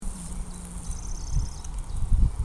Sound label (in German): feldschwirl